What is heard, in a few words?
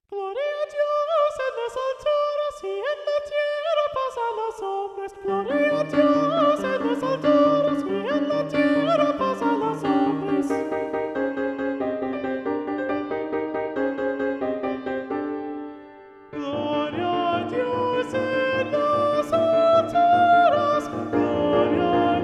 Treble 1 Muted; Gloria a Dios